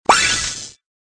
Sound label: hit